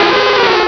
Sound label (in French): Cri